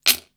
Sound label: coins table